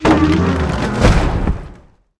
tree boss dead, monster

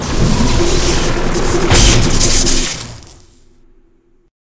mech spider attack, monster

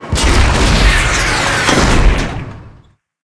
attack

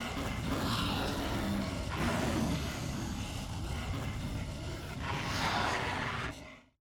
hellhound idle, monster

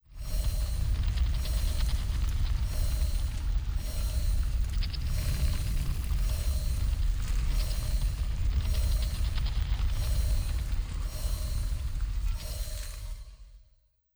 door gate main

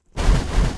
moscutter queen run, monster

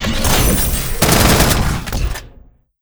atk, monster, steamer crazy

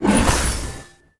fallen Bee atk1

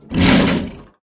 mech ape lord damage, monster

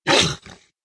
stone damage

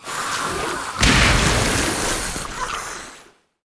snakeboss, monster

